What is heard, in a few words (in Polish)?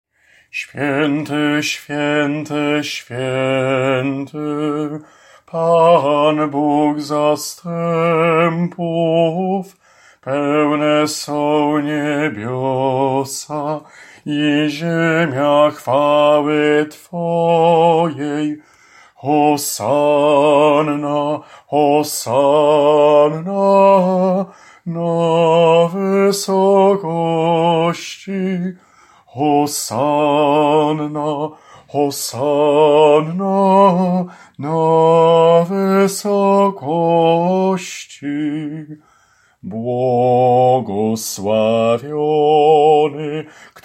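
Swiety Swiety ALT, choir alto part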